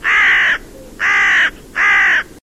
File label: Craw Ringtone